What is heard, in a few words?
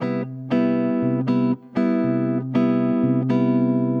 Latin B; Jazz (Джаз)